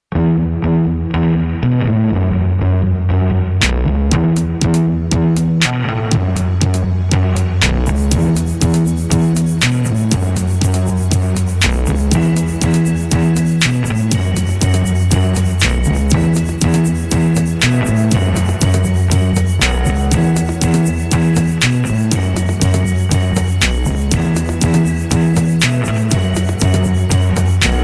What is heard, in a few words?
Steady Progress